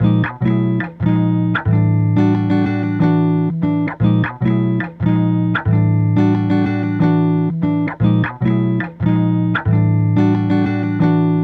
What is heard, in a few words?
guitar; Crowdsourced; TRUTH-HZ; Crowdsourced Sample Pack